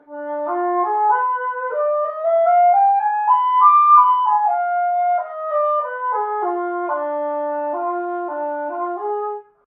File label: Wind Instrument; Assassin's Creed Syndicate